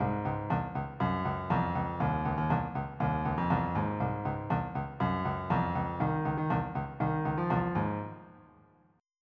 motown

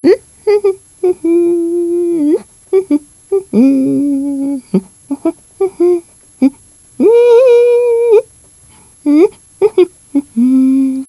hm whum

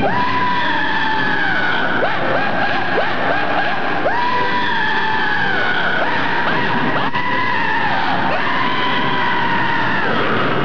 scream

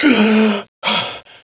gasp; SINDEMO; player; blade

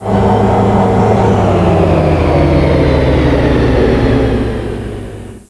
elev stop; Babylon 5 - Entertainment Utility

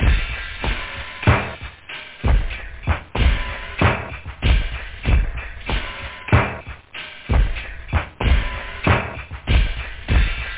etronic, loop house

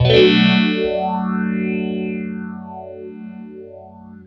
Clean Phase Bmin7, guitar, clean phaser, chords

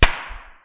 house breaking; Panic Volcano; Fireballs

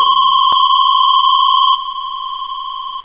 whistle; da capo vol1

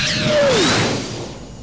warp

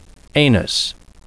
extra Sound

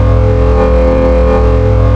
Shield; INCOMING

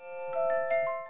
glass rose error

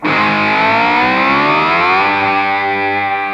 E Slide Up, Guitar

